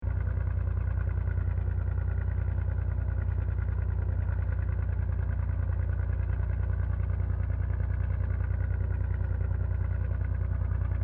BOAT TUGBOAT IDLING DISTANT LOOP, Japanese Tugboat; Boats; Vehicles